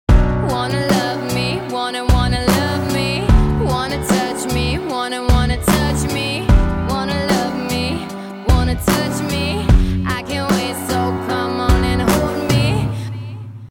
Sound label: hiphop